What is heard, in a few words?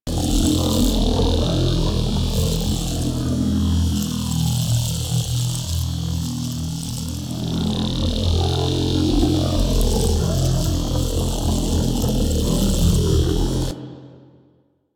Infested K Drive Buzz Loop, Vehicles